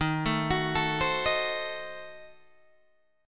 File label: D sharp Diminished 7th D sharp, Guitar, Open G